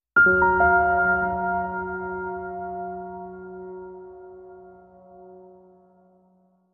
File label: Ethereal Piano Sting